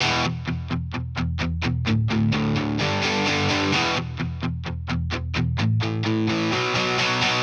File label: electric guitar chords crescendo 129bpm E minor